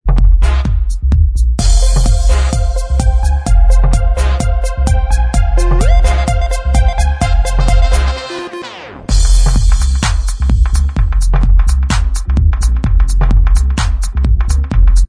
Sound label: Inst